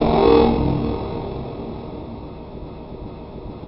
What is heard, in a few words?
Industrial Bass, Drum N Bass